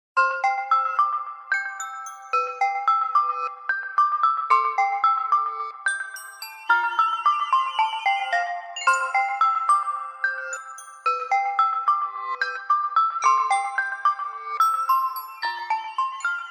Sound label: Samsung Galaxy S10 Polaris Ringtone